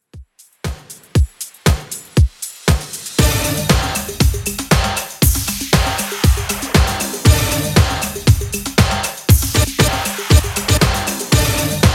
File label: 80s Redrum 118bpm